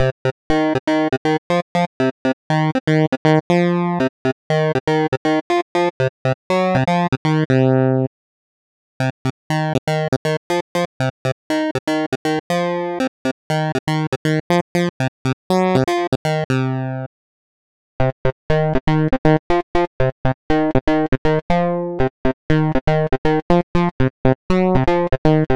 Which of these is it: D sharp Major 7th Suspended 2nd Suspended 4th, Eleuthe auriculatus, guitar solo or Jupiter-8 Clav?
Jupiter-8 Clav